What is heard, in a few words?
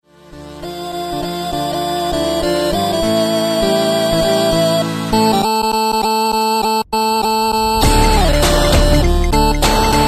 Natural 8 bits